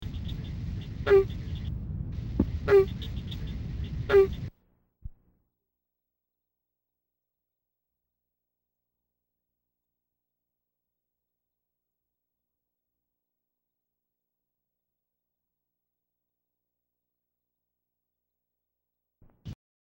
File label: Barking Treefrog; wildlife